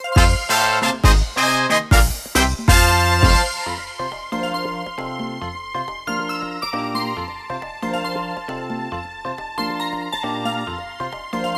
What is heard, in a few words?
Congratulations!; super mario party